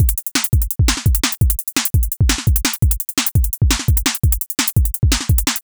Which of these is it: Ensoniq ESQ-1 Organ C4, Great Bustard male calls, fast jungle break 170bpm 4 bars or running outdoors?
fast jungle break 170bpm 4 bars